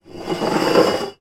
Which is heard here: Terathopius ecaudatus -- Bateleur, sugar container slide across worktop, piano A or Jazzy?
sugar container slide across worktop